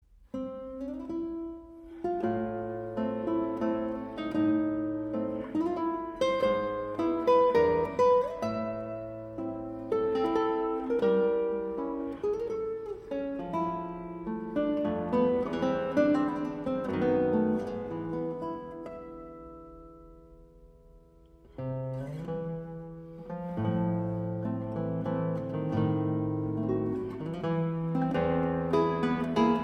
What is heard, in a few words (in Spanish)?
guitarra